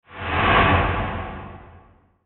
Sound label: Whoosh Dark Swell; Blastwave